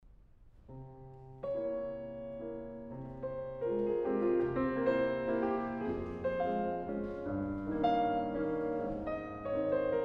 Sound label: Chopin Mazurka F minor op.63 no.2 live